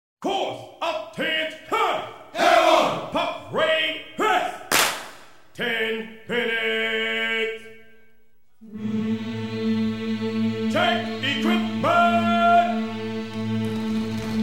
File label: Entrance Chant; 82nd Airborne